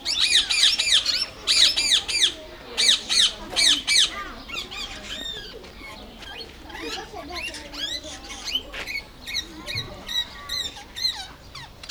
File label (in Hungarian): egészen közelről, barnafülű kakadu; állathangok; nyíregyházi zoo 2010